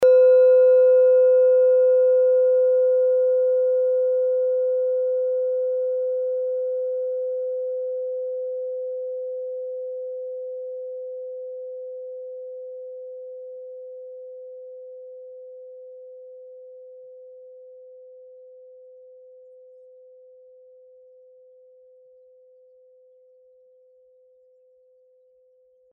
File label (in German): kleine klangschale; Diese Klangschale ist eine Handarbeit